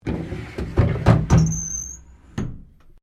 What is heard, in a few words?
DOOR WOOD CHART ROOM SLIDING OPEN, Sounds onboard HMAS Castlemaine; Boats; Vehicles